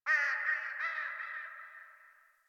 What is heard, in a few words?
Crow; Warframe; Clan Dojo; Dojo Misc; Dagath